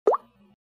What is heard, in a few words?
skip